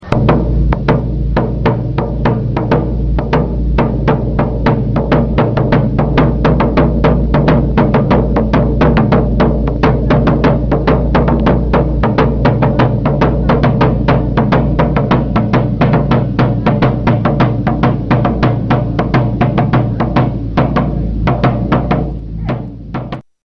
instruments alfaia

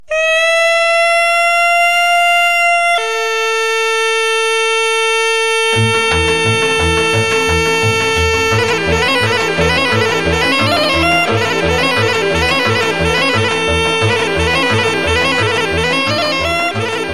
Arcanul, Roumanie

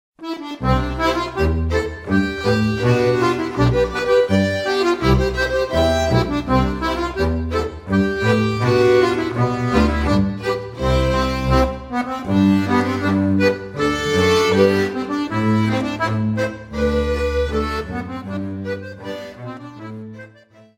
Ecossaise, Belgique

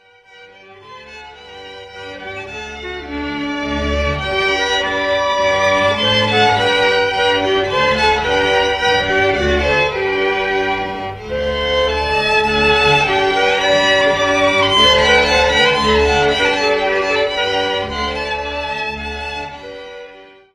KOLECKA, Republique tcheque

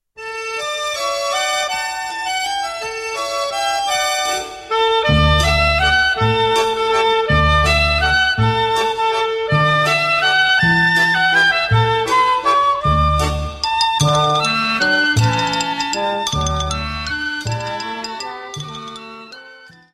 Fireturen, Norvege

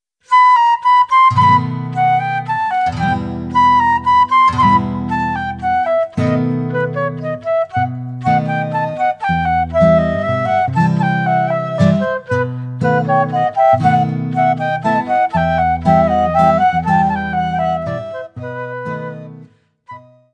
Fandango, Pays-Basque

